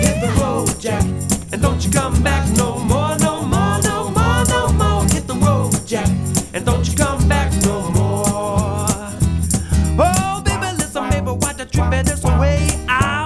Roaming Acoustic Band London Rambling Band, acoustic band